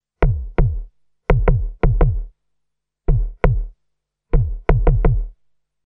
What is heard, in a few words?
Bass Dr